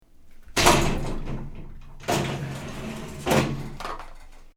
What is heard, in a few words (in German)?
duschkabine